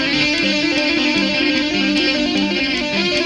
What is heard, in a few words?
guit